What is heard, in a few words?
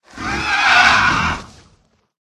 mini dragon attack voice